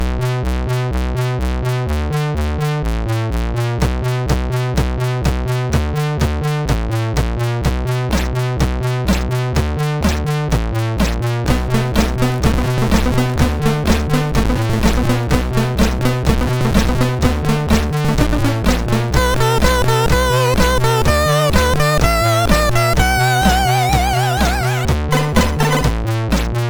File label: Legend Intro 2, sid MOS6581R3